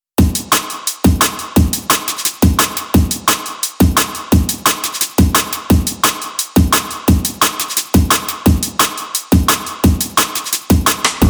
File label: Battle Drone Spacefunk Sample